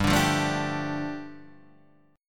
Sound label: G 7th Suspended 2nd G